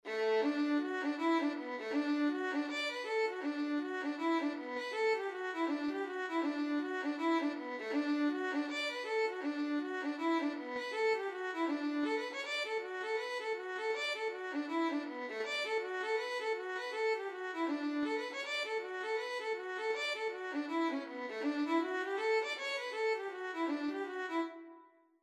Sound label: violin; cahill